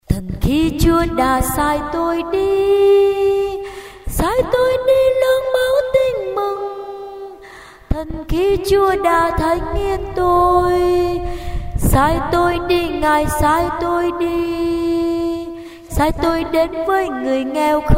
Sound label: tenor basso